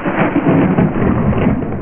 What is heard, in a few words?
Beep Lightning; KDE